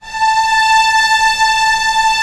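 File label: STR A0 PP, strings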